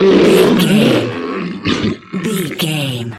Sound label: troll monster death